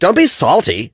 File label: hurt